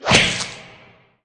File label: hit; Be Cool Scooby-Doo! - It's Dark Out There; ThrillGames